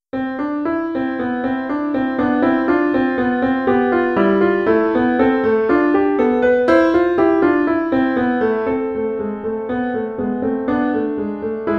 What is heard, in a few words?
intermediate piano solo